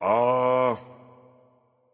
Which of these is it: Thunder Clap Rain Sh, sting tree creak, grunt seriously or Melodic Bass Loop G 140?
grunt seriously